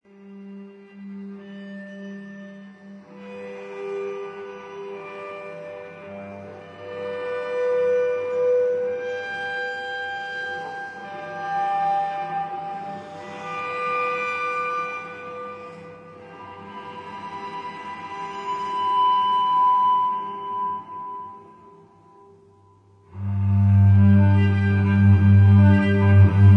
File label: Cadenza; Instrumentais